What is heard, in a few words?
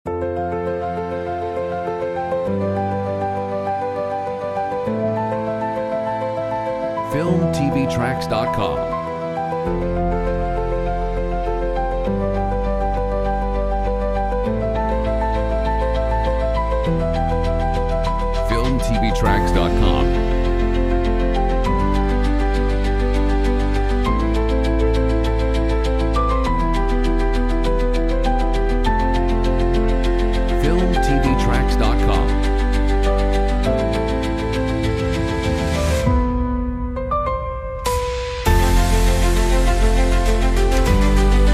INSPIRING PIANO, uplifting piano